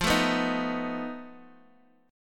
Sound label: F 7th Flat 5th Guitar Open D, chord